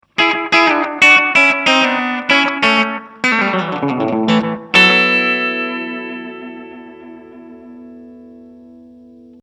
slapback ocean

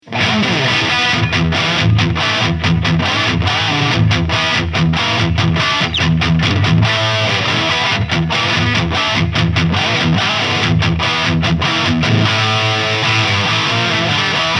Gibson Les Paul Standard 60s Faded Crunch

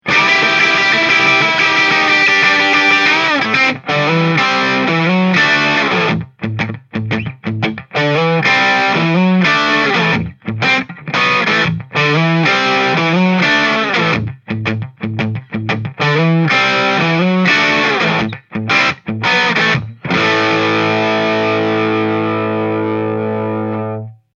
Crunch

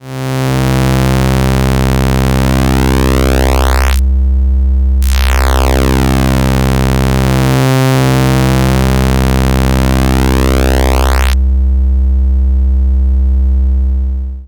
Double Saw; uniCycle

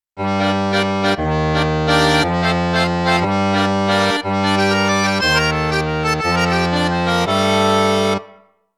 Roland Akk

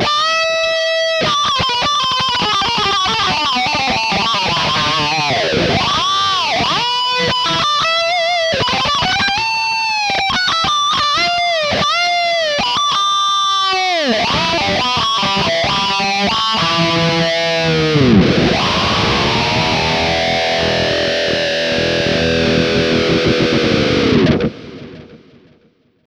Auto Quack Comp on Lead

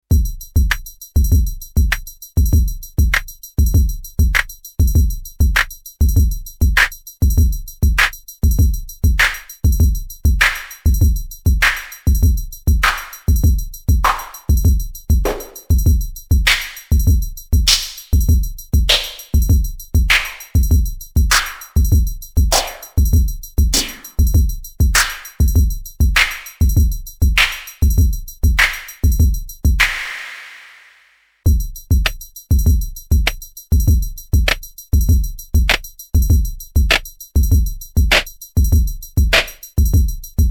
Fake Clap

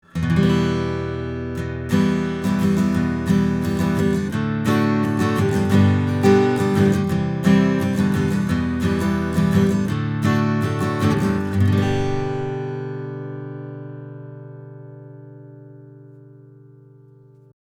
Acoustic Guitar 20cm 15 Fret Chords A

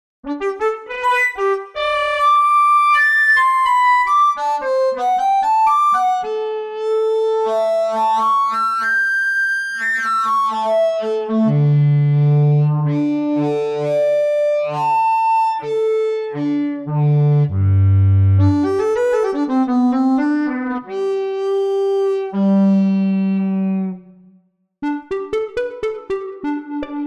Nonlinear Labs C15 Flute C15 vs NI Kontour

